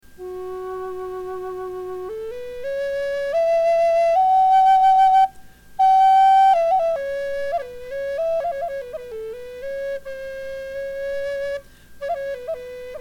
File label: Drone, flutes; windflowerflutes; Harmony Drone Flute